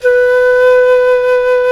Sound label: Jazz Flute; Roland LCDP04 Orchestral Winds; 90 s Sample CDs; Jazz+Singin'